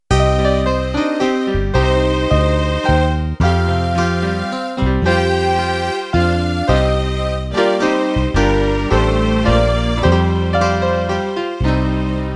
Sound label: Country Waltz end